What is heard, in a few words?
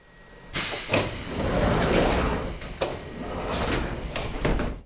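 Door Close, Train; OpenBVE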